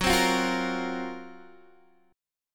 Gb Major 7th Flat 5th, down, Guitar, DADGAD

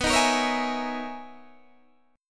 B Major 13th B down Guitar DADGAD

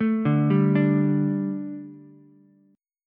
D Suspended 4th D, Ukulele; Dsus4 arpeggiated; JGuitar

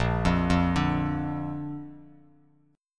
A sharp Major, down, Bass Standard; arpeggiated; chord; JGuitar

